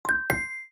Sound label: camera; GLASS